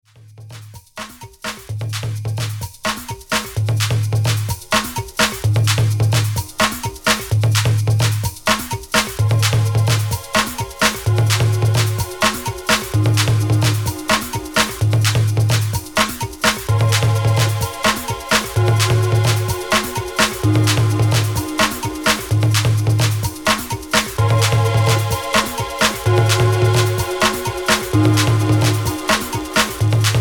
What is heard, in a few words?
african rhythms